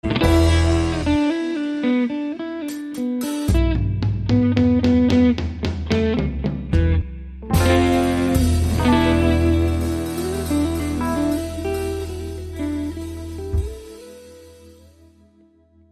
Blues

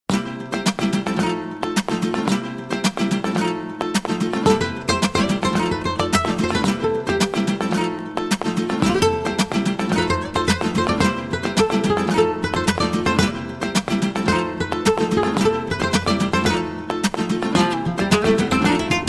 Rumba Espania

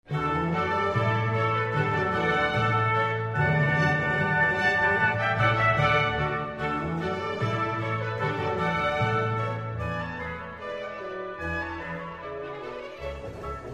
Orchestral Suite in D major Gavotte; BWV1069

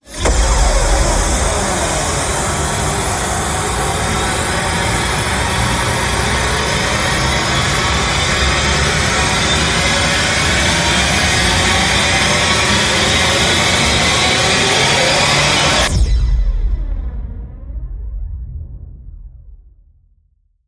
Rise Long Opposition

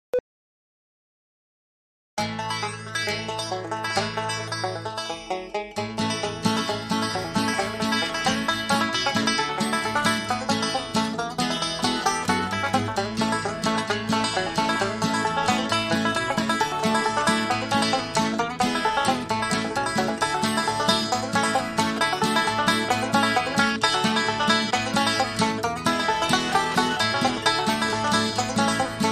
Banjo Tune, Split Mix

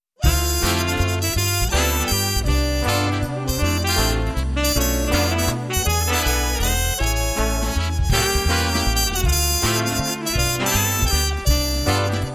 Jazz Brass

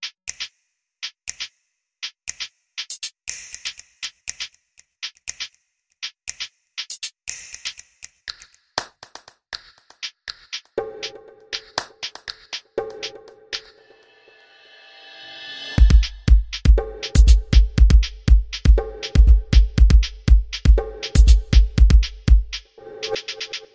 Percussive

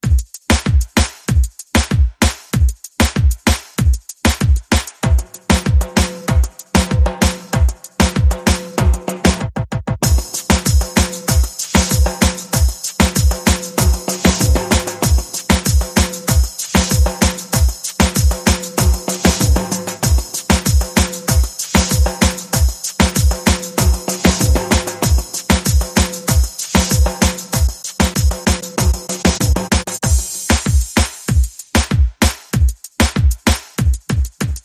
Perc and FX Only

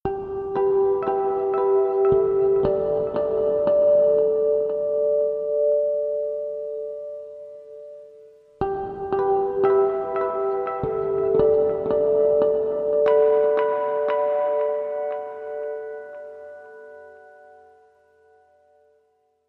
AMB Guitar

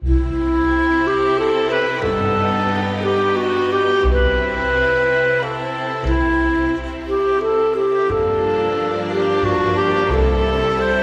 Strings and Winds Only

